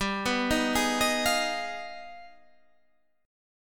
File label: G 7th Flat 9th Sharp 5th